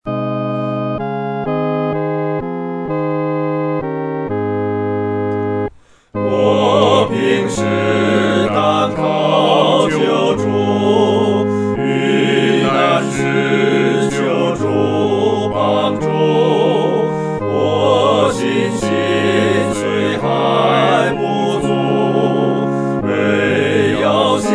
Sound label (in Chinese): 合唱 四声部; 信靠耶稣